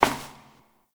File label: Step Perforated Metal; Warframe; Koumei